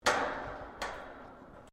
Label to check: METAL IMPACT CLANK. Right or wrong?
Right